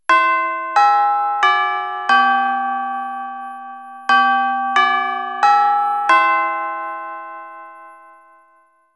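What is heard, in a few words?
chimes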